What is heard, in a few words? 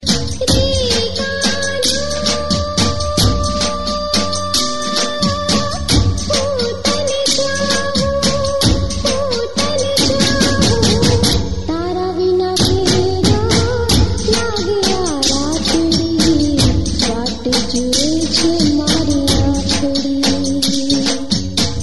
Gujarati Ringtone